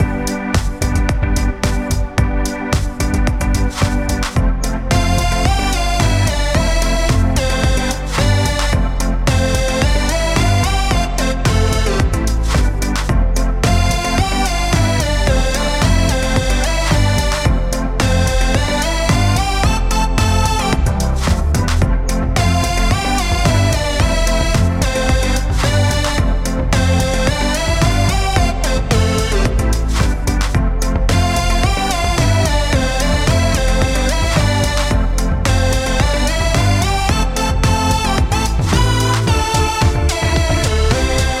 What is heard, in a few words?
racing game result good bpm 110